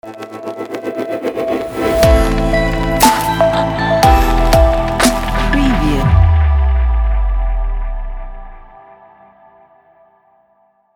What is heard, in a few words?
electronic smooth logo reveal